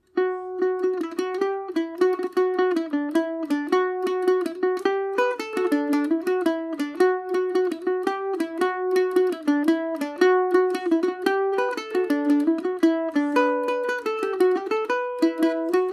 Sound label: foxhunters jig dmaj revisited, mandolin